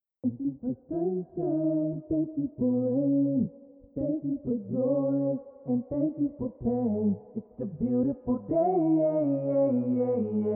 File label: Acapella; 128.0 BPM